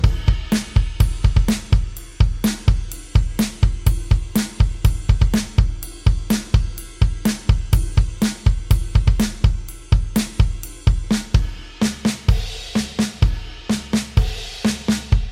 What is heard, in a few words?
drums pattern